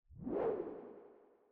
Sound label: woosh